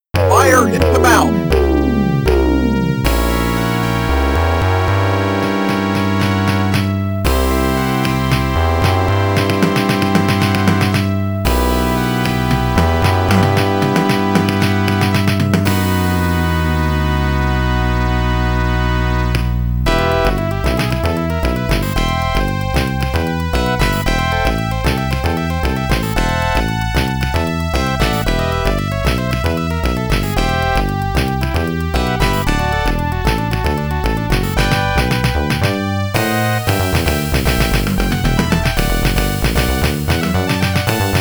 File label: Monster Stage Bonus BGM